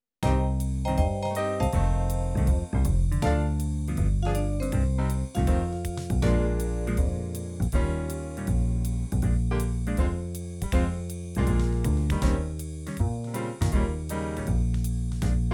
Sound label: Bb Blues